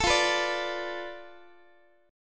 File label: F 6th Flat 5th F down Guitar, chord; F6b5 strummed; x,x,12,15,15,13